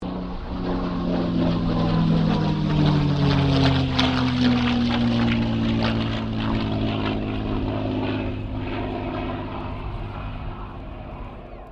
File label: AIRCRAFT CA-18 MUSTANG PASS